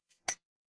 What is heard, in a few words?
glass ceramic